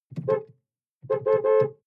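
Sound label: sports car interior horn rhythms short; verkehr-transport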